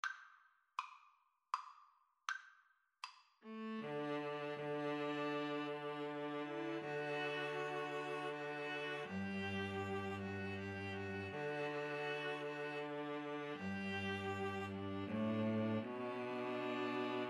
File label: Shenandoah String trio